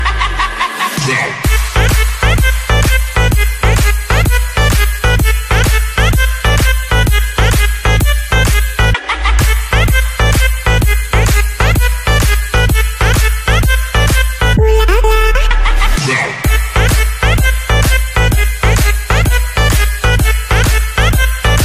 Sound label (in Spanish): ingles ringtone